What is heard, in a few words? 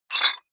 dumbbell pull up rattle soft, fitnessstudio